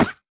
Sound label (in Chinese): 犰狳受伤减免声